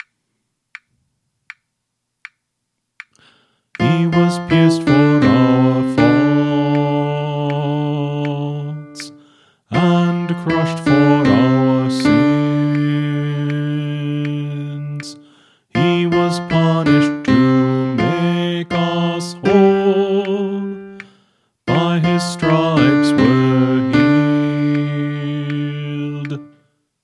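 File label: Song, Choir Practice Tracks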